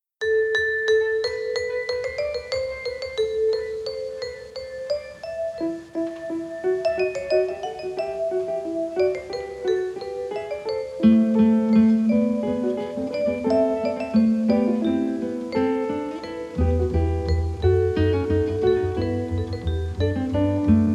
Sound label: Fugue in A minor